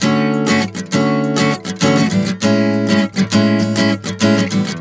Dmaj7-C#m7 100 bpm, acoustic guitar chord progressions